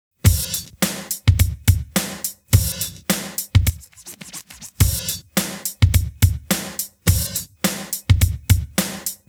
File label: drums pattern